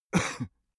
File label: male cough subdued; soundpool